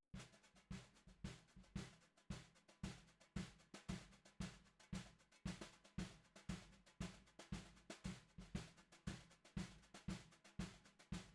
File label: SNR Bot